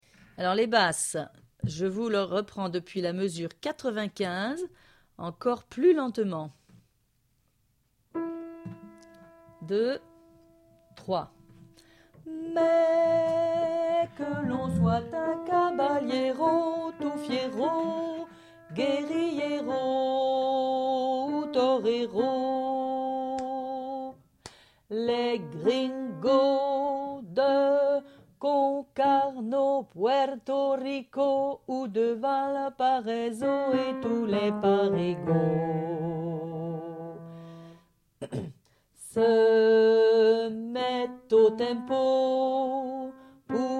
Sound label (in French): basse bolero lent